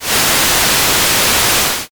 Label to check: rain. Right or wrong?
Right